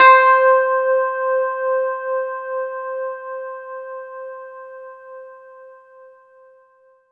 C5, Synth Line; 90 s Sample CDs; NiceBeats; SynthLine VOL-23

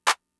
Clap; Fricke MFB-522

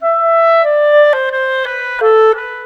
Clarinet, Cinematic; Sonic Foundry (Sony Creative Software); Orchestral Series VOL-3; Cinematic 24

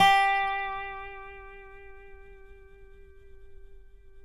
DOBRO NOTES; Sampleheads; Whole Lotta Country 1 & 2

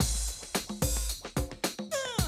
Classic DrumLoops, 110

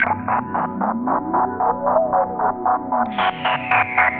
Beatsweeper; Sonic Foundry (Sony Creative Software); Synchro Funk

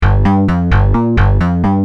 Rage, Bass; Industrial

